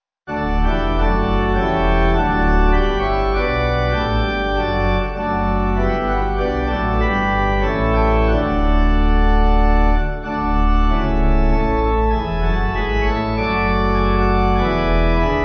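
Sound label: Pipe Organ, Music Backing Files for Churches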